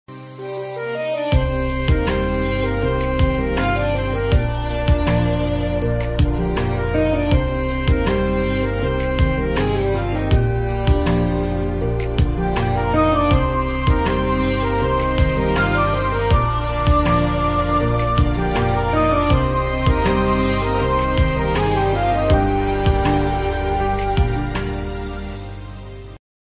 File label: song bgm ringtone; akshay kumar